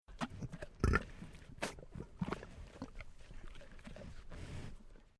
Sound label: Nature Animal Cow Beast Coughing Burping Guttural Noise Monster